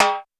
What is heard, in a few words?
Roland U220 Dry Set